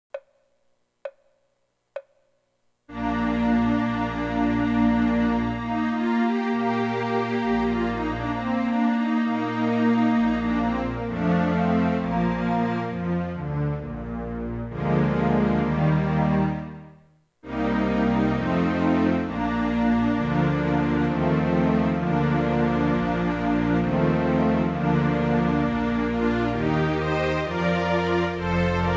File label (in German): hornkonzert orchester; mozart